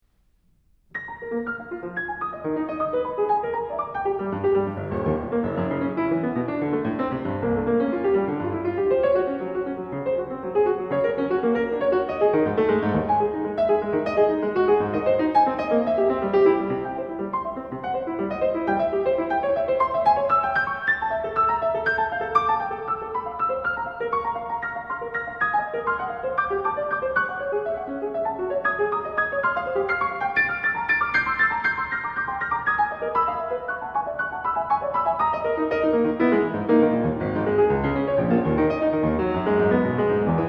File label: Prelude